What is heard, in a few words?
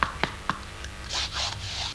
elk; Little Red Riding Hood